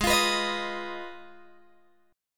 Ab Minor 6th Ab, down, Guitar, Open G

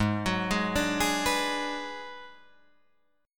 Ab Minor 7th Flat 5th Ab, down, Guitar, Drop D

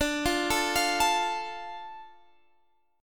D Minor D Guitar Standard; arpeggiated; JGuitar

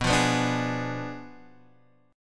B Diminished 7th B Guitar Half Step Down

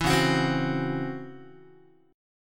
Db Minor Major 11th Db down Guitar; strummed; 7,9,9,10,10,x; 4A,4E,5A,5D,5G#,6C#

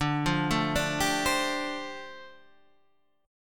D Minor 11th D down Guitar Standard; Dm11 arpeggiated; JGuitar

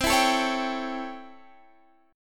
C 6th Flat 9th C; strummed

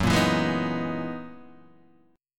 F sharp 13th F sharp down Guitar DADGAD

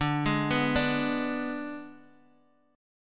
Ab Diminished D

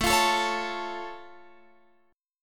A Augmented A, Guitar Open F; strummed; JGuitar

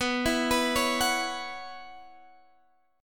B Suspended 2nd Suspended 4th, Guitar, Open G

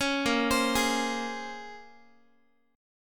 A sharp Minor 9th, A sharp, down, Guitar; A#m9 arpeggiated; 16,18,15,18,x,x

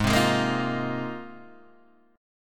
G sharp 6th Add 9th G sharp Guitar DADGAD

